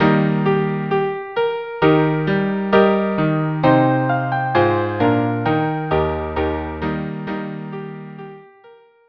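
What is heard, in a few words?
quartet; Telemann Helden 5 L'Armenent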